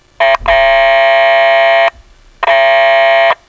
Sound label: SVP Horn